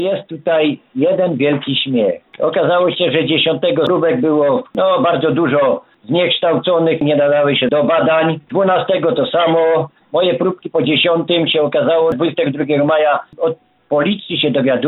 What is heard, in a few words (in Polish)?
smiech